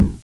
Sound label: Retro Bass Drum; Retro Game Weapons Sound Effects